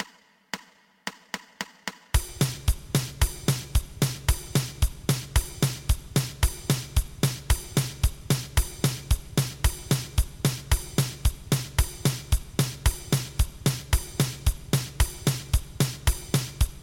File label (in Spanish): 2 pulsos